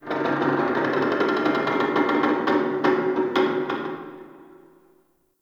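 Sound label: Metal Creak Distant One Off; Ambience; Warframe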